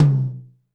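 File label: tom, acoustic set; drum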